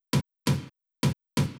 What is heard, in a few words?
EDMS TOM